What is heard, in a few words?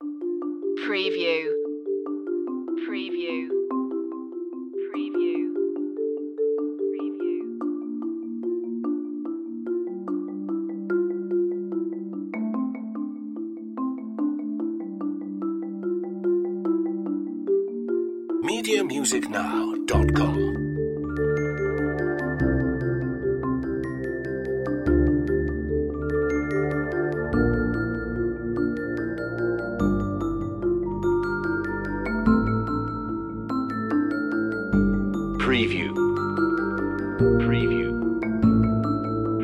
no perc